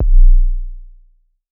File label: E1 Pure Tuned Kick, Tuned Sub Kicks; One Shots; Touch Loops; ElementsOne; Abstract Rhythms